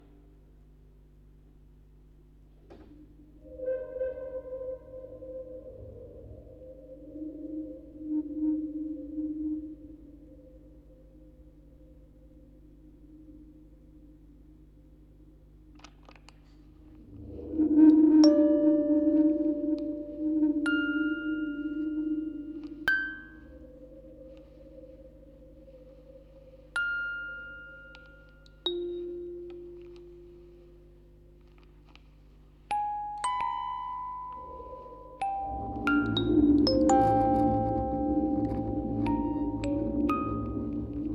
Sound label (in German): Aufnahme Spieluhren Quartett 4 Kanal stereo; Tonband; Fieldrecording